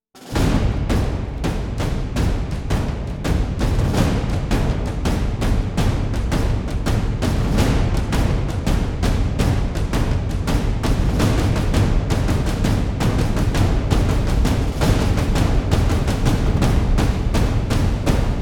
percussion mix; megatrax

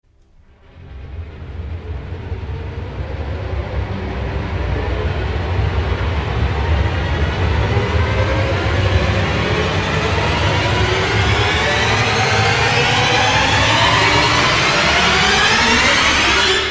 rise

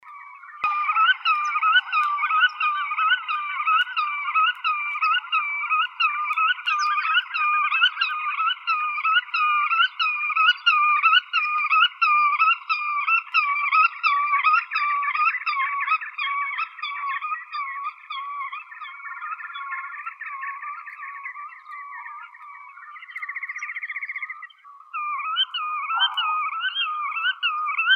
veretennik bolshoi limosa limosa

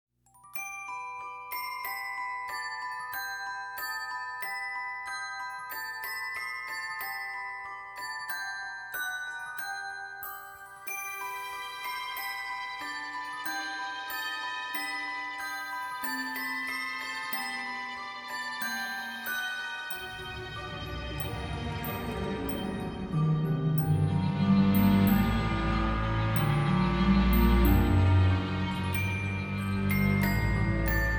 deck the halls non choir no sleigh bells

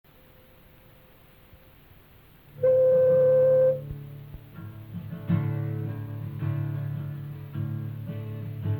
guitar instrumental

